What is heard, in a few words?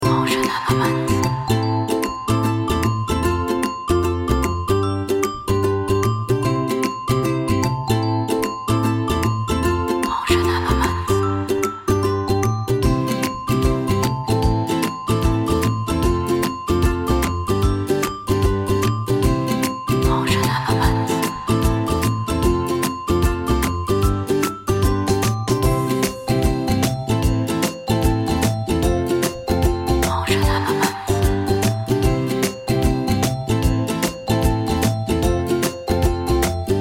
happy background ukulele folk loop; MotionElements